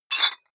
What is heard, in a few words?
dumbbell pull up rattle soft, fitnessstudio